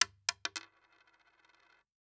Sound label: Household Coin Dime Dropping On Wood Table